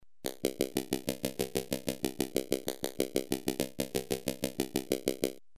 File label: pulse width